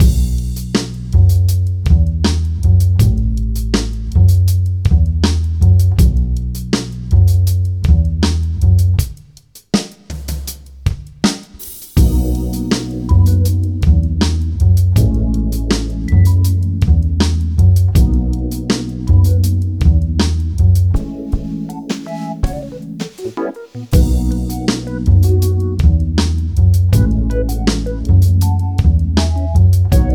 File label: High Score; Ms. Pac-Man Quest for the Golden Maze